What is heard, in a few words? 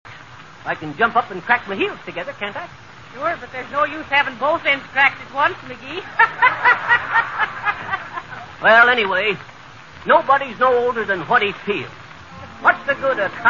rap